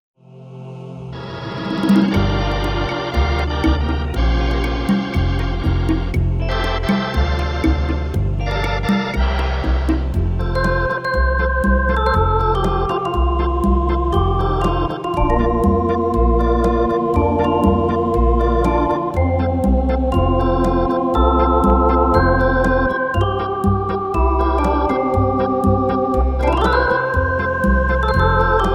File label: Rumba